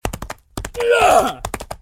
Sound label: attack; Blind Gladiator; Torquatos